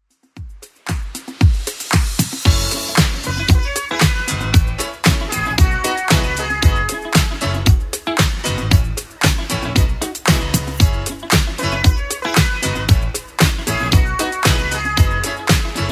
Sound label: 80s club 115 bpm clean